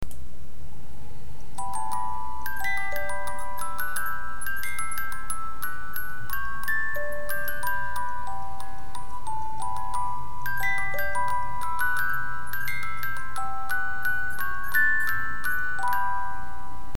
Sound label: 18 Note